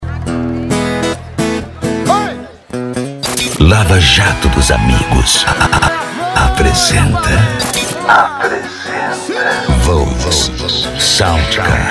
sertanejo e pisadinha; cd volks sound car

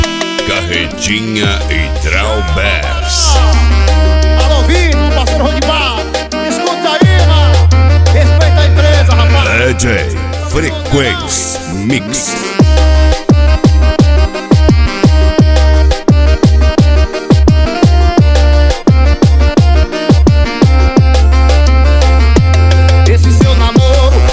piseiro

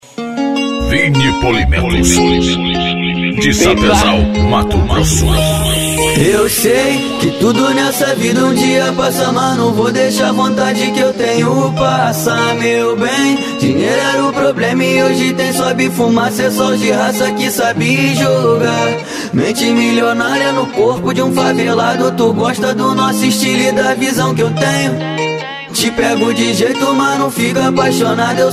funk e rap

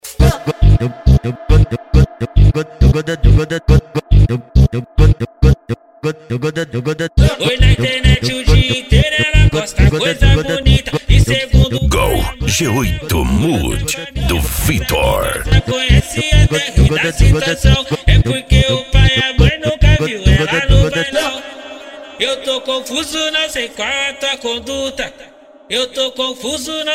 funk ritmado

